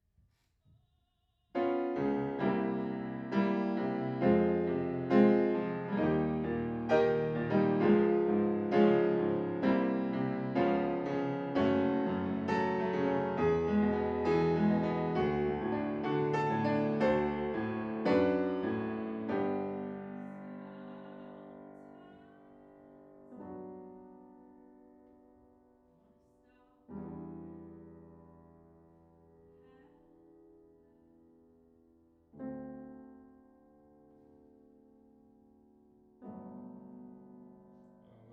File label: Piano Room